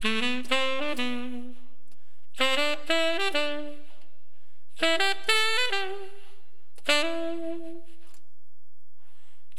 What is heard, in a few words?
TENOR, Wind; LiveAct Pool; AcidJazz Funk